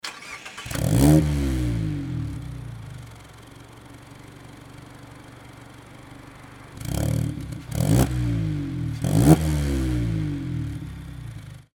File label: nd roadster; nd5rc; maka